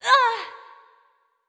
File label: Prone; Going Under; Jackie